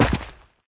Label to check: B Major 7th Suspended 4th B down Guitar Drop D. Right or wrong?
Wrong